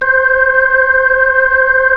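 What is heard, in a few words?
B-3, Hammond B-3 organ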